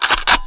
launch

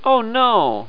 lose; pball95

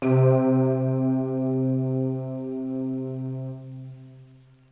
Hybrid Keys; Dance

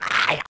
skel hit; DAoC

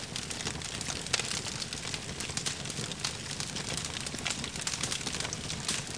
Yule Log Loop; HOLIDAY

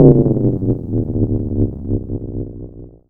Menu quit; Mars

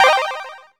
chords, Synth

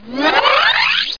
Sound up; How Many Bugs in a Box

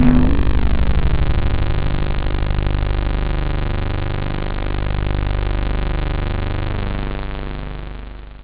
lo synth

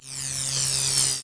WIPE

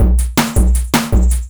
DRUMLOOP D+B 160, Drum Bass Loop; eJay

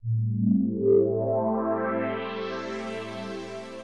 SYNTHPAD HOUSE 125 A; eJay; Sphere

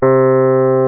Clavinet G4; SoundFont - The Developer's Choice - Volume 1

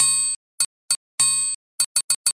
rythm

